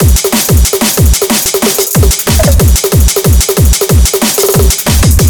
Hard Drums

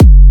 Kick F full note

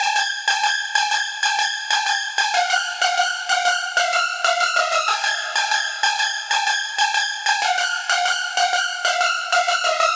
Tek Synth Lead

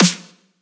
Tek Snare

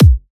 Kick G

